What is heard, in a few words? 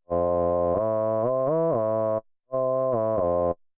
Ahh